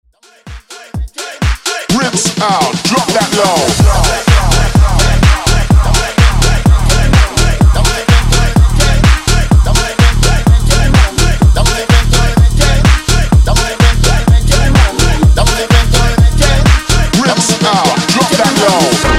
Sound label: Funk Festival Segue Bootleg 126Bpm